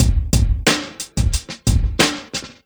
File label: Drum loops Boombap